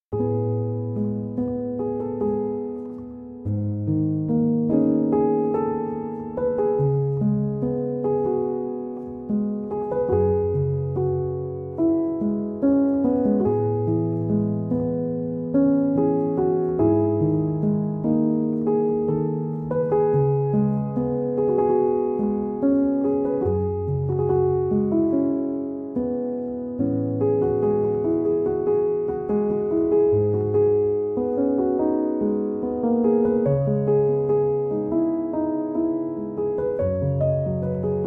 piano instrumental

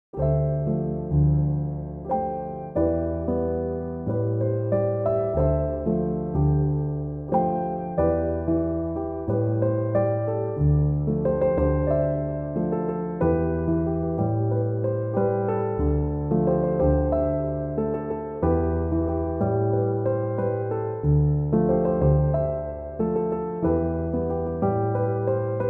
piano instrumental

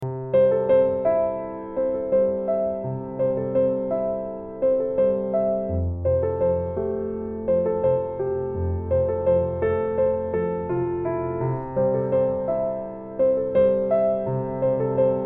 Piano; Barcelo Hotels recepcion

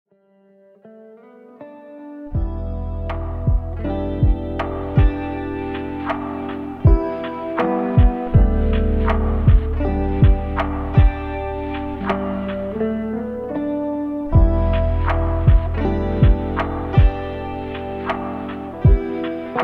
Lofi, ambient; LUXURY AMBIENT

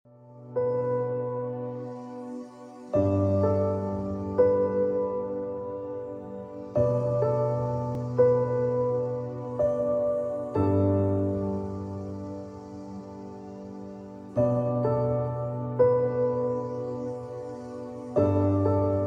Piano Relaxation

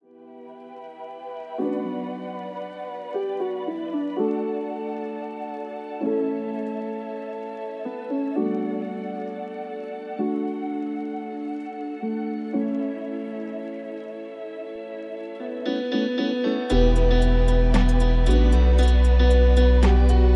instrumental lofi

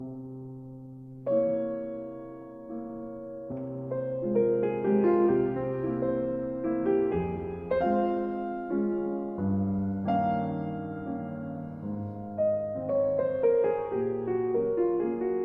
Mazurka In F Minor, Op. 63 No. 2; Chopin

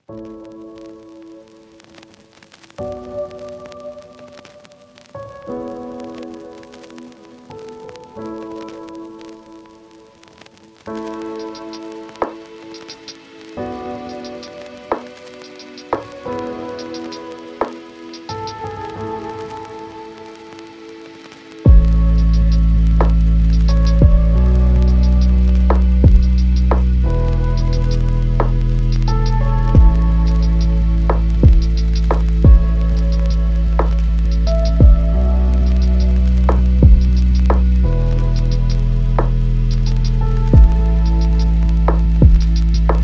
instrumental lofi

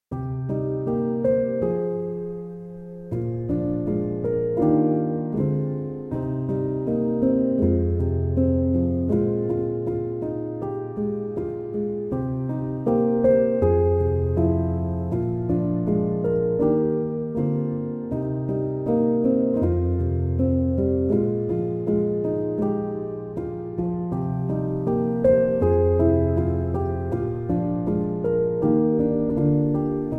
piano instrumental

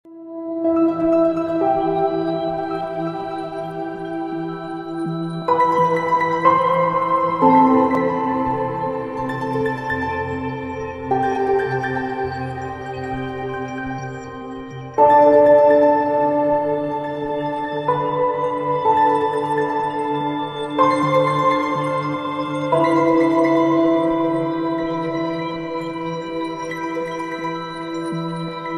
Piano Harmony

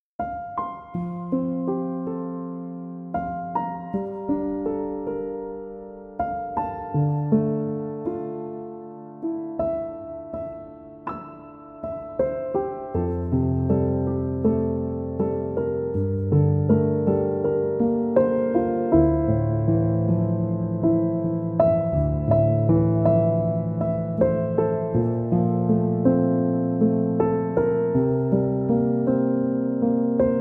piano instrumental

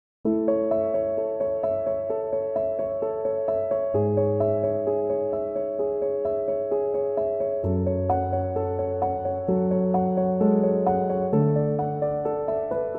piano instrumental

